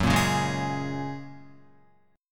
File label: F sharp Major Add 9th F sharp, Guitar; F#add9 strummed